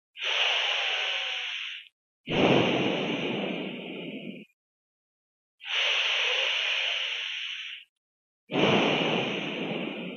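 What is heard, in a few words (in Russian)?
звук дыхания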